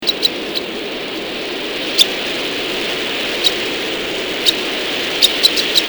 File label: Calidris alba